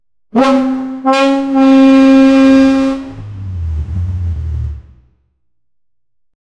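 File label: truck roar horn short; hulk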